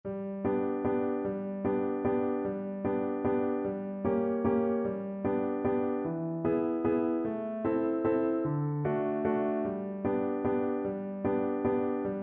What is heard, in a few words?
childrens album waltz, Flute; tchaikovsky no 8